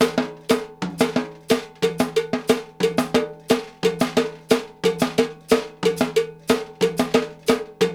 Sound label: tom trip, drumworks; Sampleheads - New York City Drumworks VOL-1